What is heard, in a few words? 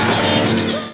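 wall hit; The Dream Machine - The Virtual Sexual Experience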